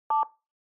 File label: Telephone DTMF, 3